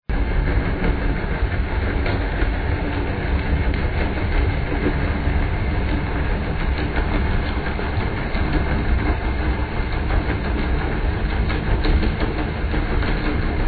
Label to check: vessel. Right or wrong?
Right